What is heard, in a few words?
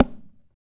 CHARANGO FX; Guitars; Spectrasonics; Hans Zimmer Guitars VOL-2